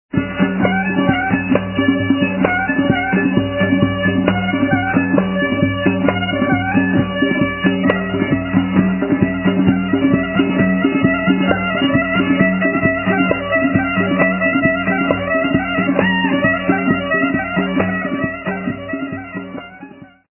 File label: kamancheh